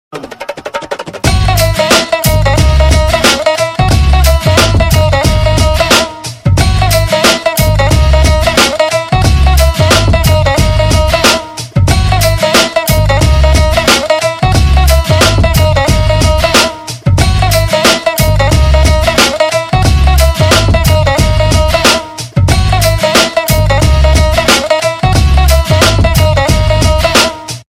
Awesome Music Tune, Ringtones

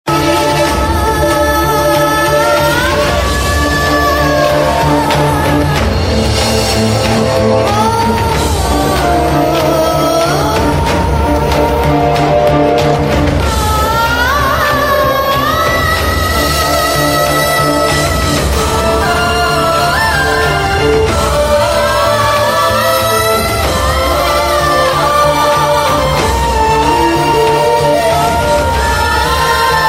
Sad BG Tune; Silsila Pyaar Ka; Kajal

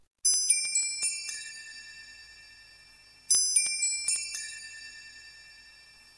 Best Sms, SMS Tone

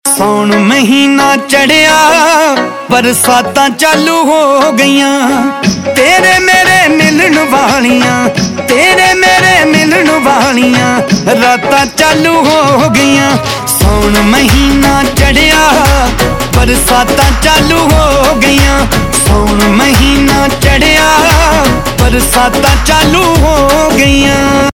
Punjabi Ringtone

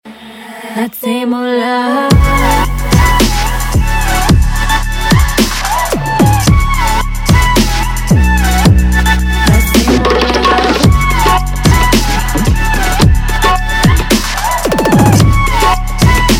EDM Music With Tabla Mix

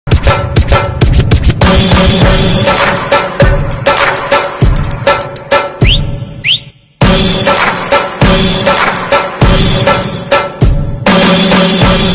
Drum And Sitti Wistel

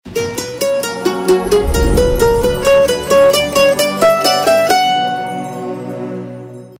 SMS Tune Ringtone; Bollywood; Dilwale

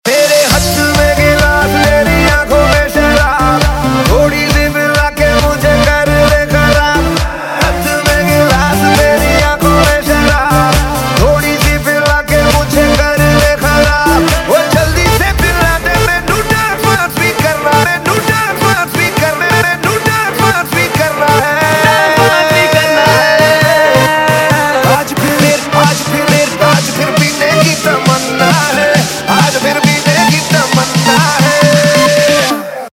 EDM Remix Ringtone; Bollywood; LoveShhuda

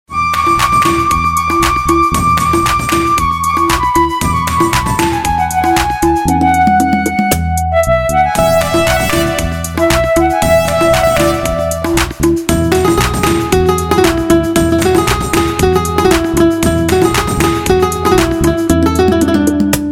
Instrumental Tone; Khiladi 786

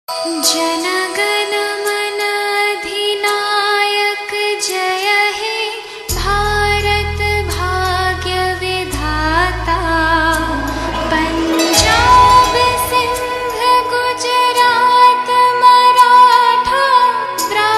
Female

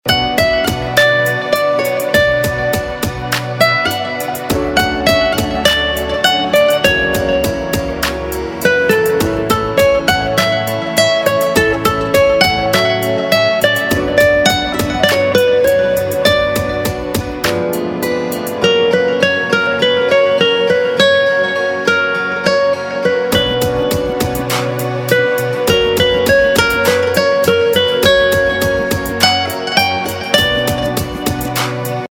Instrumental Ringtone; Bollywood Hits (2014)

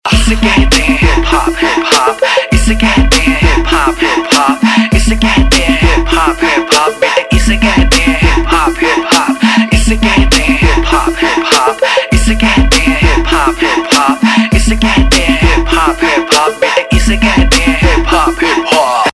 Hip Hop Ringtone

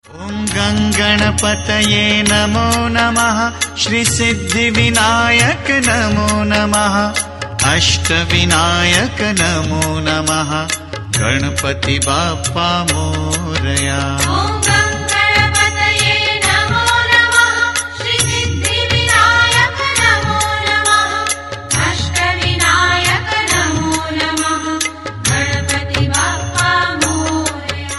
Male; Ganesh Chaturthi Special Ringtones

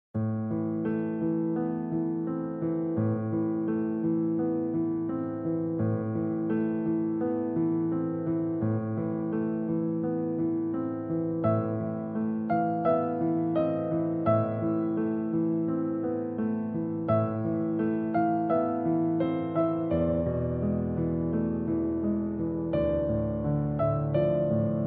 Main Piano - Theme

